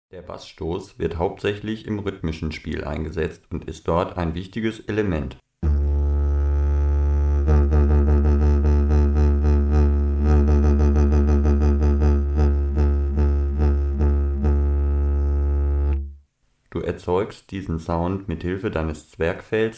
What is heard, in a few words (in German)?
Der Bass Stoss